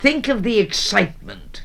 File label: VOX GENERAL; Megabass Remix! 2